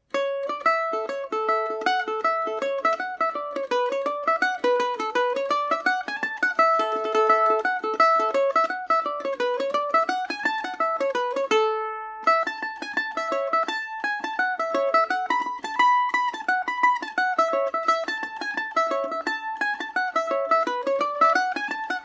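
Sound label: A major, Irish mandolin; maids of tulla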